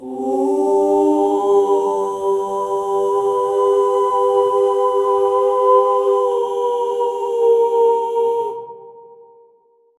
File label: horror film opera vocals